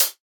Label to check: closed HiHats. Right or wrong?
Right